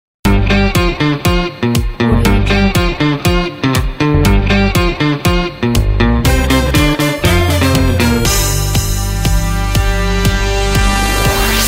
funky; commercialmusic

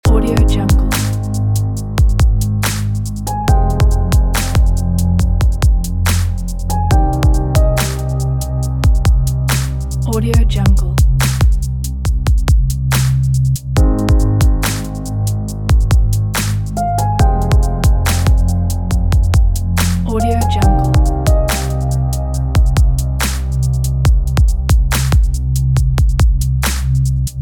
ambient loop

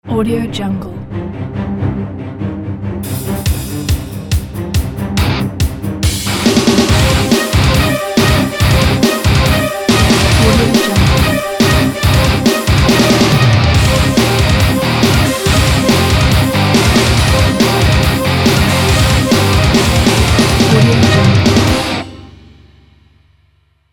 epic cinematic metal ident; 83crutch

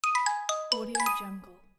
lose

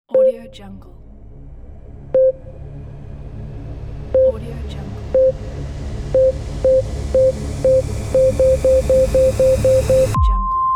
countdown ambient beep

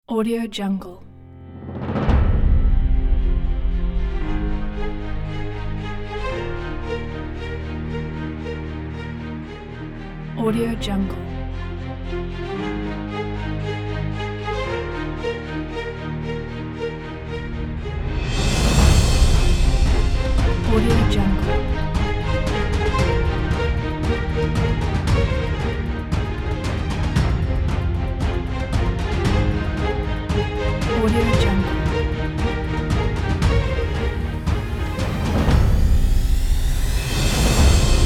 epicness

